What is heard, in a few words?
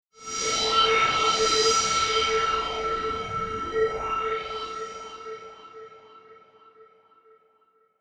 Space Sound